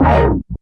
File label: OWW; East West - Phat & Phunky CD2; 90's Sample CDs